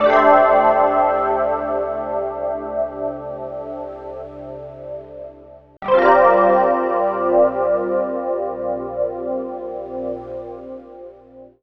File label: Chorum Wash 165 Bb, Guitars; Total Drum&Bass